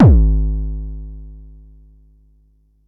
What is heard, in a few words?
Bassdrum, Jomox Xbase09